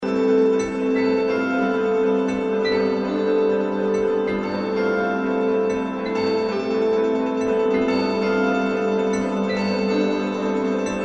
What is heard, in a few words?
Weird Piano